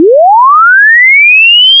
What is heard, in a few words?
phaser